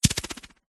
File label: warfare knife throwing weapon spin fast air